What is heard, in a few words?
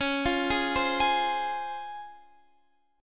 Db Major 7th, Guitar, Drop D; arpeggiated